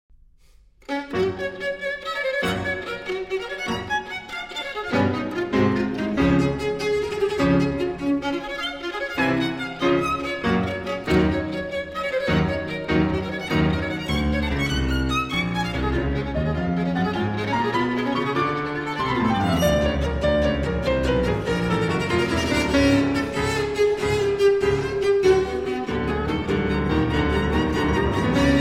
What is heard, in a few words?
Piano Trio Allegro non troppo